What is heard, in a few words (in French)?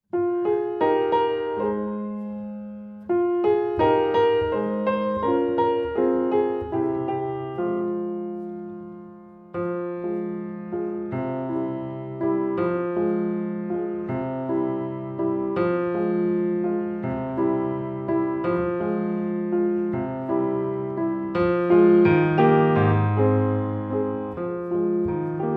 automne instrumental